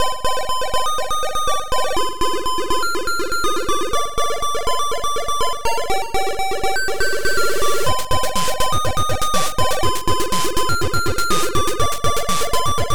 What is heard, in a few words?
Russian pops